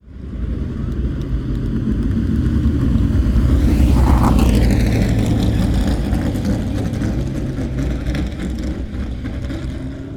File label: Can Am pit